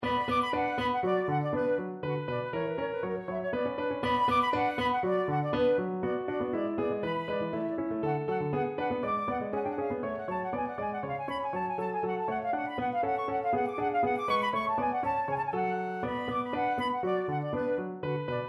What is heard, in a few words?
bach badinerie from orch. suite no. 2